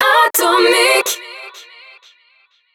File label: JINGLE acap; ATOMIC; HABILLAGE ACAP